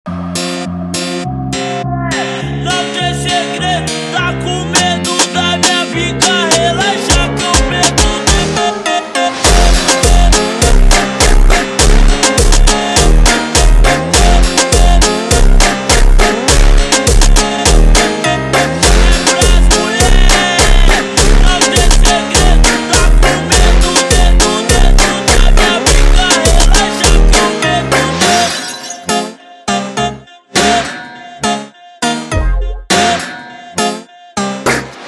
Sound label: Funk ultra Slowed, funk